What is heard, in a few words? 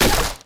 wooden